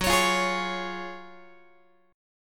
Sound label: F sharp Major 7th Suspended 2nd, F sharp, down, Guitar; F#M7sus2 Chord; strummed; x,14,16,15,12,12